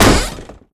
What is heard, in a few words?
coffin burst; ambient; dota; diretide